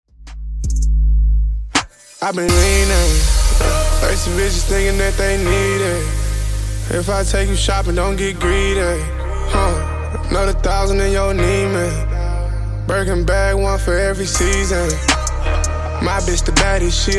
Funk Intro Outro 81 Bpm

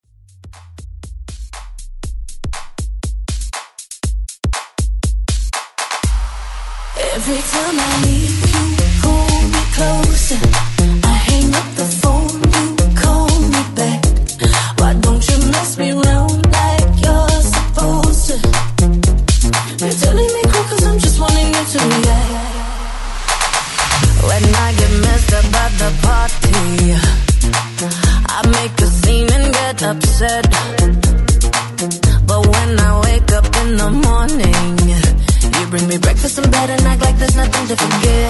ReDrum 120 BPM

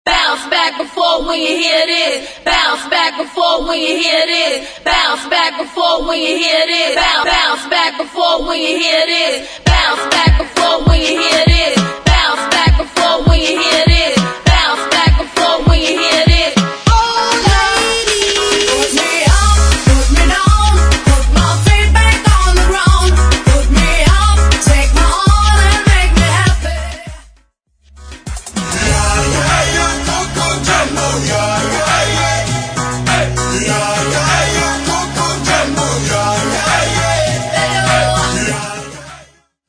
Acap Hype

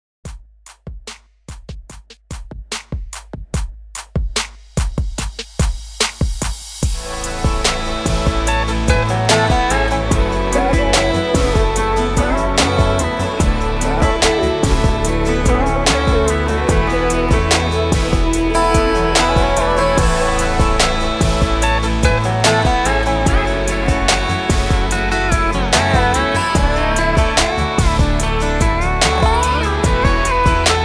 COUNTRY REDRUM 73 BPM

